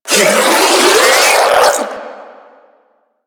Melee Mid Spin Scream; Warframe; Infested; Ancient

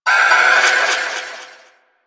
Ship Data Transfer Pulse; Warframe; Corpus Ship Remaster

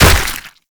Proto Infested Mutalist Big Land Flesh; Warframe; Abilities

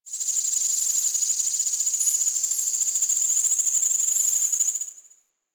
Rock Critter, Ambience; One Offs; Creatures; Warframe; Quests; The New War; Drifter Camp

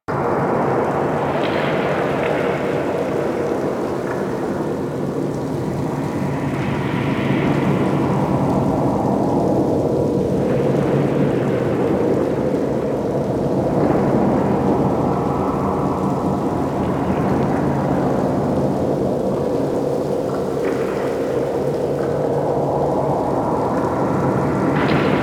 Ocean Cave Amb

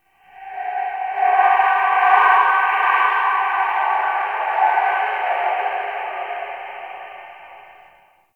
Champ Crypt Spirit Cry; Ambience; One Offs; Champion Crypt

